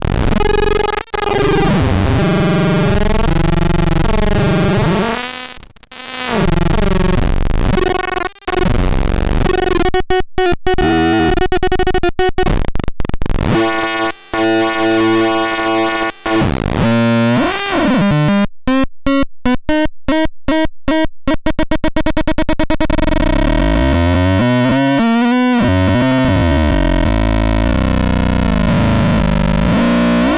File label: 2 step sound block